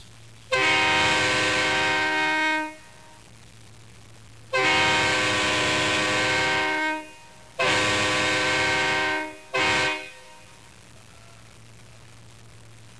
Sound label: train horn